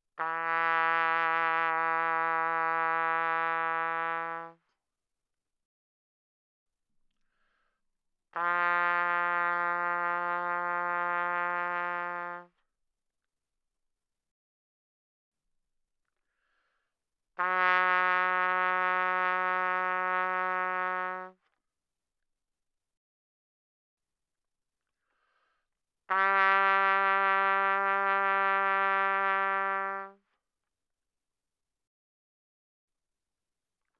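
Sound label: Trumpet vibrato mf E3 B3; Brass; Bbtrumpet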